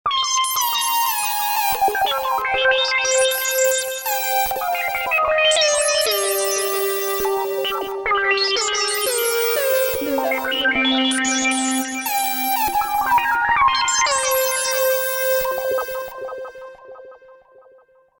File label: Sequence C